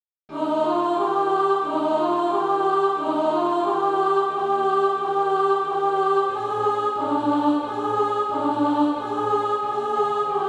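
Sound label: alto, SATB Practise Music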